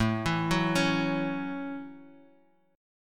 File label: Gb Minor 7th